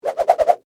Whip Whoosh Multi, Throw; Foley; Whips (Sound Effects:Objects); BlastWave

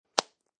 Gum Bubble Pop, Gum popping sound; Pop Sound Effects; Objects

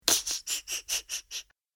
Sneaky Villian Laughter, evil laugh

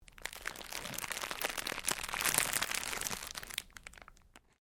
Cellophane crinkle

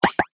Multimedia Button, Technology, Multimedia, Button; Buttons

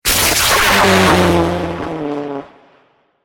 Rocket Launch Small, Scifi, Missile; Science, Fiction; Spacecraft; BlastWave

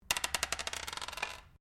Dice Small Pair Glass Table Throw; Variety of dice sounds; Casinos

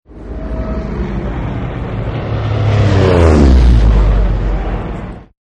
Dive Bomb Corsair; Field Fire; Battlefields; War; Partners In Rhyme

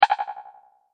multimedia rollover, Multimedia Rollover Tone; Sound Design; McKinney Sound

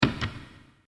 Spooky - Door Closes With Reverb; Creepy Door Sound Effects; horror sound effect; Andrew Fleming

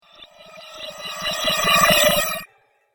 Alchemy Magic Gold; Magic Sound Effects; Alchemy - Magic Gold 2

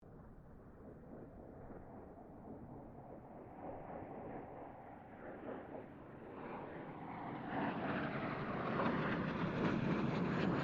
AIRCRAFT CA-27 SABRE PASS DISTANT

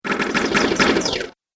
Game Machine Breaks, Video Game Sound Effects; Twistedtracks

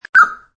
Blip; Beeps; multimedia

